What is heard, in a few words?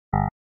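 sounds of operating system mac os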